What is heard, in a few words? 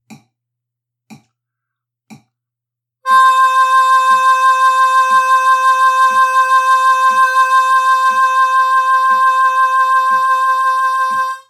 Vibrato 6Hz, harp